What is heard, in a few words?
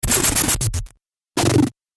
Dubstep Scifi Effects; Science Fiction Sound Effects